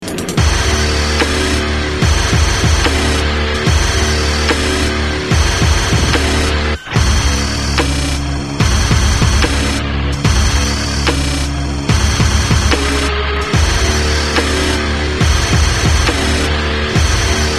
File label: drumcorps; adnoiseam; breaks